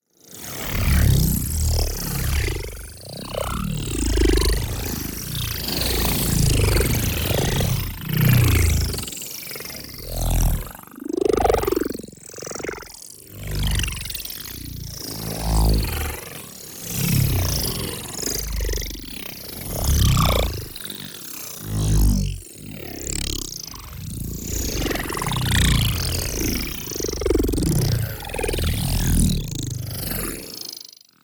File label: NEON PARTICLES LARGE SLOW; Cyber Worms; boom library